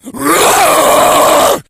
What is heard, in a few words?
rage, infected, male